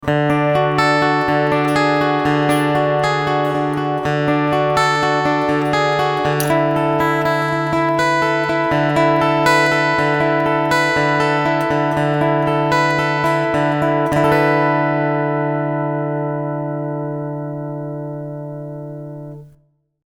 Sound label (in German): EQ flat mit Cool Tube